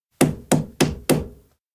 nail hammer wood; anemoi

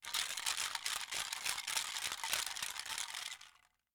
Shake; anemoi

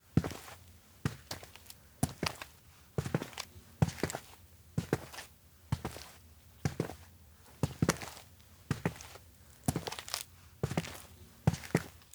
steps outdoor road walk; LOOP; anemoi

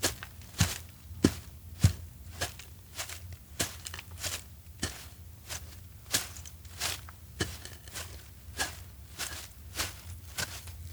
steps outdoor grassland horse walk; anemoi

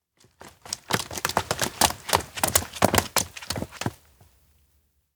steps outdoor town rain run up group; anemoi

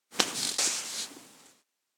handshake; makoto; anemoi パッケージ版 1.1

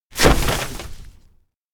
door shed open fast; anemoi

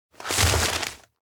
docs mass place; fumiya; anemoi

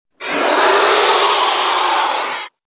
creature damage; anemoi

